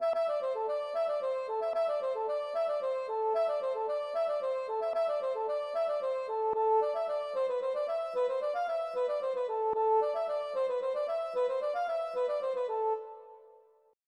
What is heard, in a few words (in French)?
ridee